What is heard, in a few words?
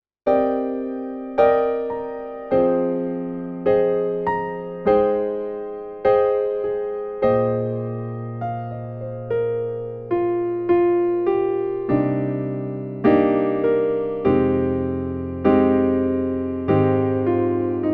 Casio PX-S5000 Piano